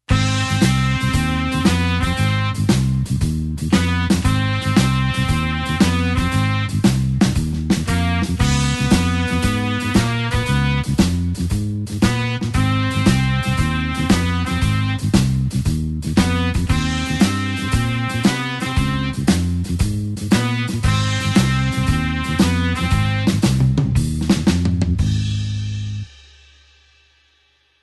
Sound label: blues horns shuffle Ab